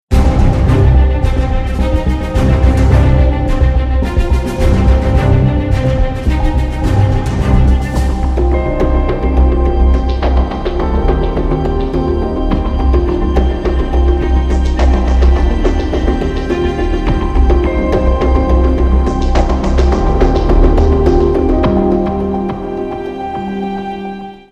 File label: river ringtone; i phone xs max